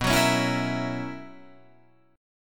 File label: B Major 7th Suspended 2nd Suspended 4th B down Guitar Drop D